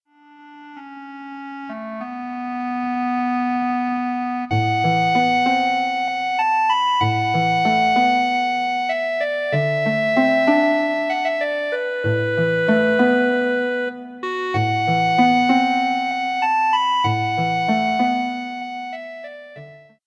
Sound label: Clarinet